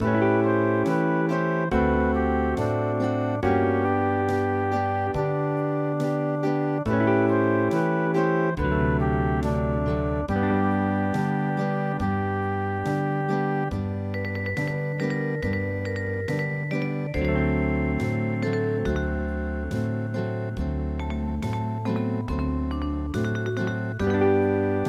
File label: Hawaian; AINA O MOLOKAI